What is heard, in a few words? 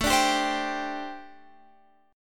G Suspended 4th A; Gsus4/A strummed